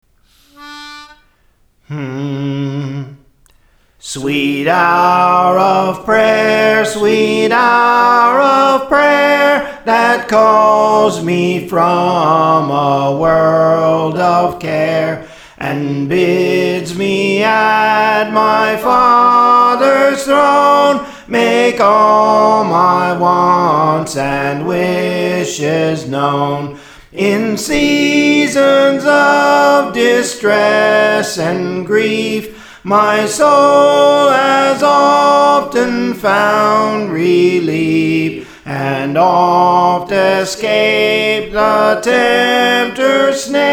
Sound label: Soprano; Sweet Hour of Prayer; Praise For The Lord Training